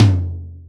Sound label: High Tom